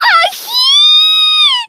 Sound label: aheeeee